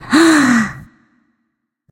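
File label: nonverbal breath; smite; voicepack; izanami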